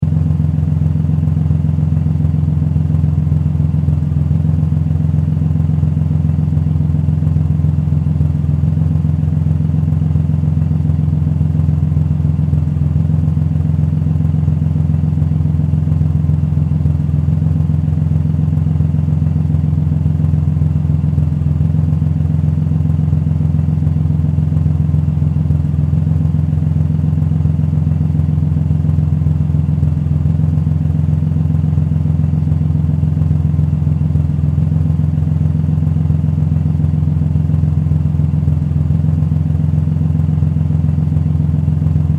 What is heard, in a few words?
Power Car Idle Loop, Engine loop; Power Car sounds; Power Car Idle Loop Long; Car Sound Effects